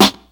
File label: old school natural rap snare G key, snare tuned to the G note. Loudest frequency: 2442Hz